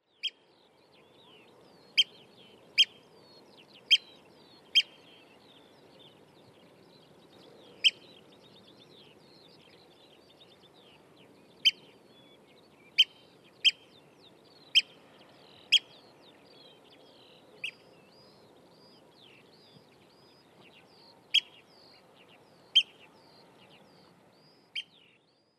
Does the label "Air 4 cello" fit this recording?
No